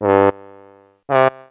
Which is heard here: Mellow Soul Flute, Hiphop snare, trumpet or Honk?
trumpet